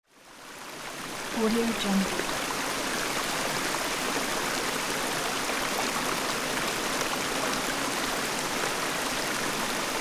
fountain

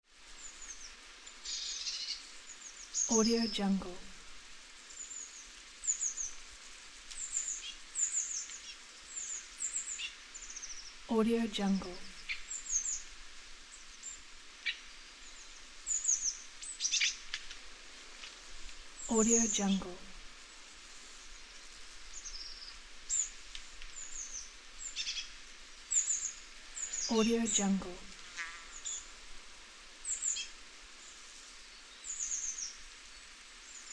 spring nature ambience

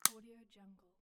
plastic light switch; stormwaveaudio

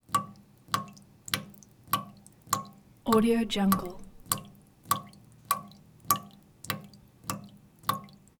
kitchen sink drips metal sink drops leak faucet

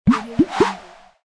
popup

